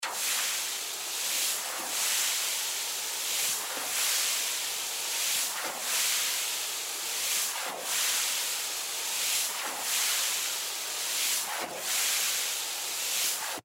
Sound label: Hose Spray Movement, Water Hose Spray Movement Wash Car; Spraying; Blastwave